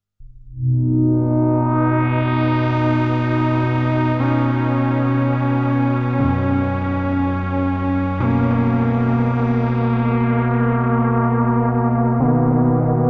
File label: Gamechanger Audio Motor Synth MkII Arp MIDI